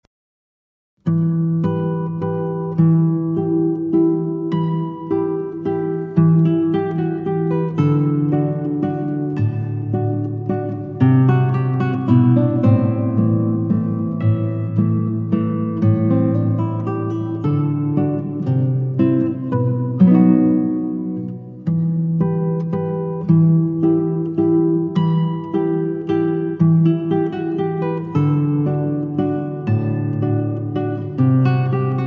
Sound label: classical guitar; dewdrop